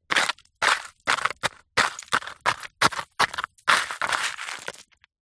industrial fossicking trowel plastic digs scrapes dirt stones dry mud